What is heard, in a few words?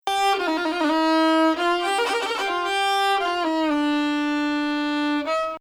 Bayati, Maqam Bayati; Bayati 1